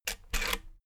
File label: rotary control plastic rattle turn